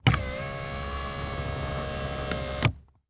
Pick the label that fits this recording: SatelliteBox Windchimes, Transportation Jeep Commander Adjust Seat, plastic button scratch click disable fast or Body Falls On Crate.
Transportation Jeep Commander Adjust Seat